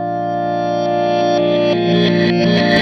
Strat Guitar Loop

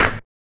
RSS PERC

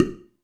Loose lip, Drum Machines Alesis D4

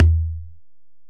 Ac Perc, Drum Machines Alesis DM-PRO

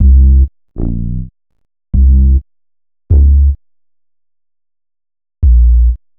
Bass, Futurist Drum & Bass

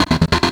GTR HITS, Distortion Gtr; WEB GTR HITS; E-MU Producer Series Vol. 8 – Platinum Phatt

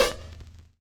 vinyl snare; Trance Explosion Vol1

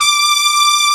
Section Brass D5; Proteus 2000; Digital Sound Factory Proteus 2000

